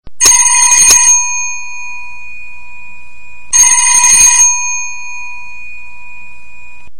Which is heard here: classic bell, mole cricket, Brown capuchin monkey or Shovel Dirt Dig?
classic bell